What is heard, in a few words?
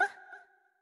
Attack; Winkubus